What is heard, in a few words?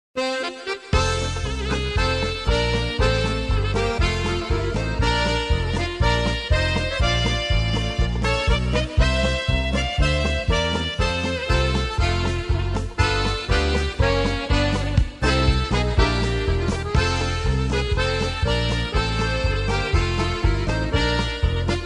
Polka